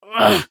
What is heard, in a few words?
death; Escape from Tarkov